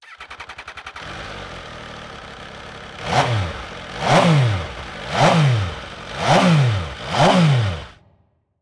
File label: dragster rr scs